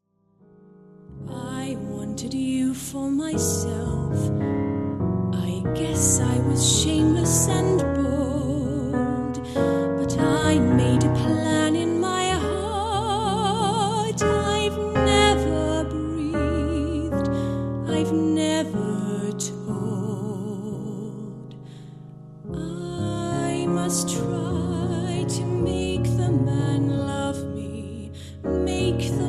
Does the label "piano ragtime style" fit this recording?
No